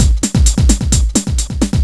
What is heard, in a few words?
run bpm130, drum&base